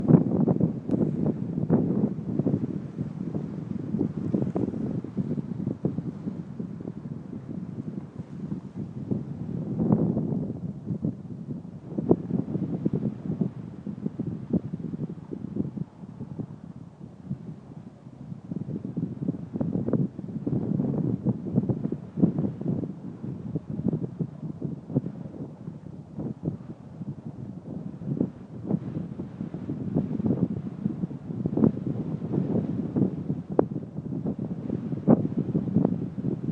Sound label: windy hill